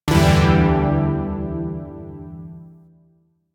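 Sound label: Shawzin Major Chord E; Zariman Shawzin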